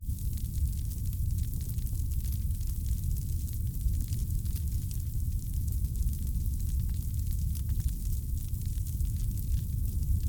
Fire burn Burning Campfire Crackling Squawking Flame Roar